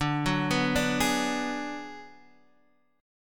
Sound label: D 6th Flat 5th D down Guitar DADGAD, chord